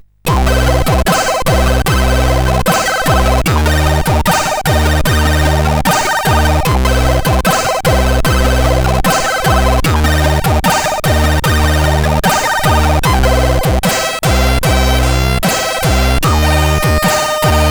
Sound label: Slowly, sid CSG8580R5; Sidder